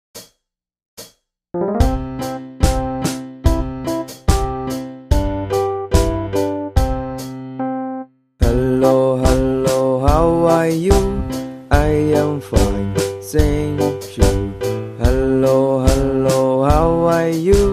Hello Song; បទខម្រៀង-សួស្តី

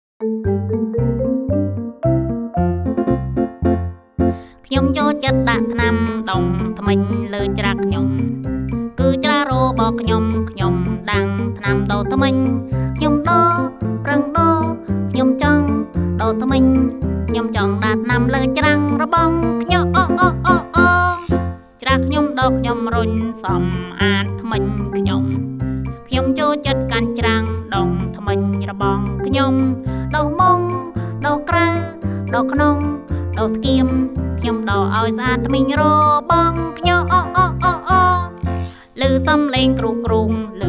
Toothbrush Song; បទចំរៀង-ដុសធ្មេញ

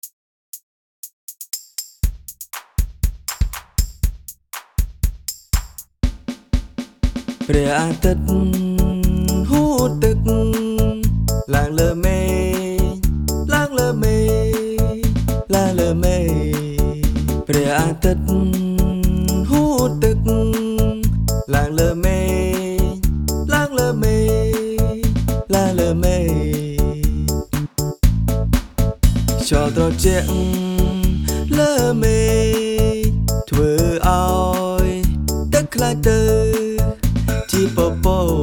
Rain Cycle Song; រង្វិលជុំទឹកភ្លៀង

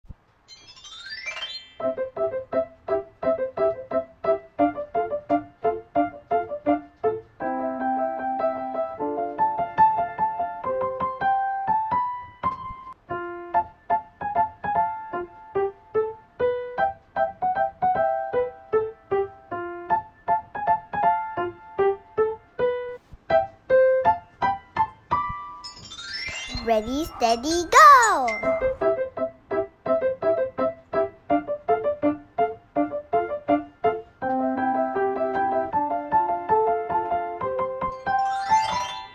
Twinkle Twinkle Dance; រាំពន្លឺ

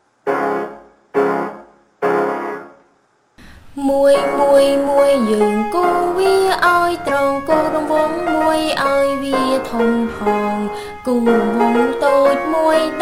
Drawing 1 2 3 Song; បទចំរៀង-គូរ-1-2-និង-3

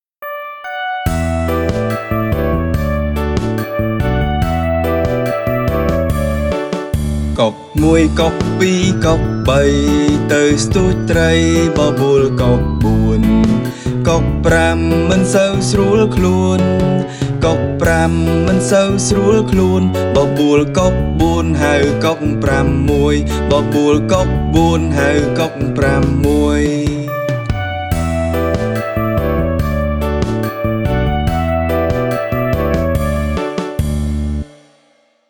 1 Egret 2 Egrets 3 Egrets

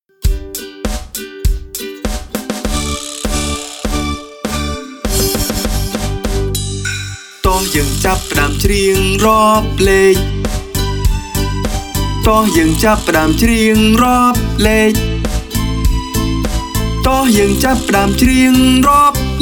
Khmer Number Song 1 10; បទចម្រៀងលេខខ្មែរ-១-១០

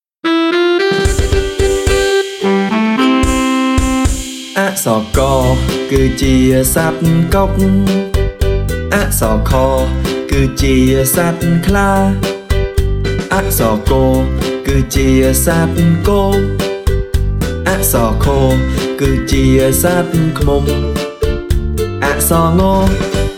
Khmer Alphabet Song; បទចម្រៀងព្យញ្ជនៈខ្មែរ; ក-ង

